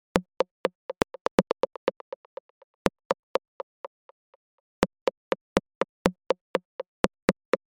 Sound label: 122 BPM Rims; MMB; Percussion Essentials